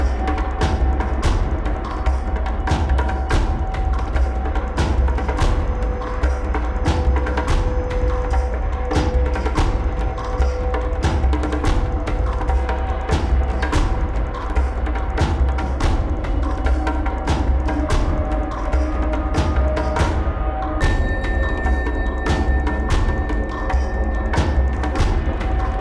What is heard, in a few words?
Drums Ambience; Assassin's Creed III